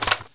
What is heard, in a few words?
mp5sd bolt pull